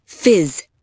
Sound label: fizz